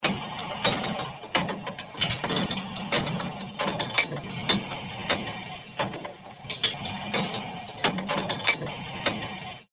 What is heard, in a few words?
Slot Wheels Turning